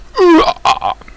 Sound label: dark die